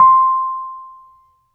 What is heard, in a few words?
RHODES EP C5; Sonic Reality; Vintage Timetraveler; RHODES EP2